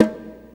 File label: Soft Conga